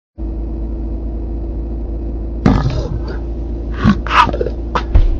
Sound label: headshot